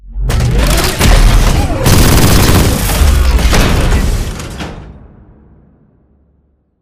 execute kick shoot; bt; Titanfall 2

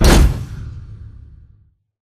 pod door ext verb close stop; Titanfall 2

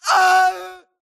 grunt death crush; Titanfall 2

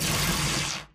bullet energy titan arc; Titanfall 2

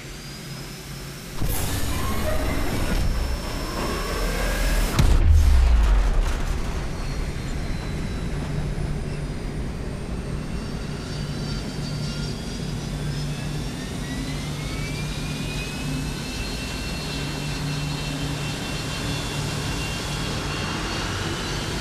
evac warp fly out; crow; Titanfall 2

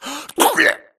grunt death neck; Titanfall 2

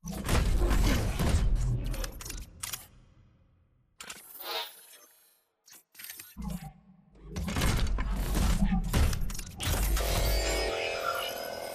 control room skit reverb; Titanfall 2

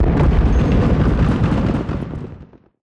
scripted distant fog battle; Titanfall 2

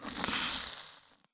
imp bullet energy default sizzle; Titanfall 2

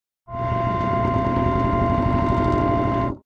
Giant Floor Panel Assemble Start servo; Boomtown; Sideways Town; Titanfall 2

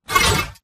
Mvmt Servo Walk; AI Stalker; Titanfall 2

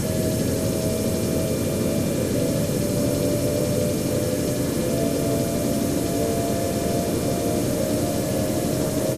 generator shield recharge resume; coop; Titanfall 2

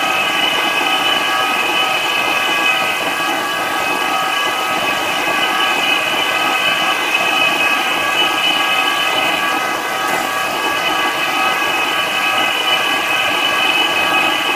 Giant Pillar Pods Active Hum; Titanfall 2; Boomtown

